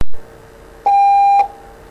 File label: Morse T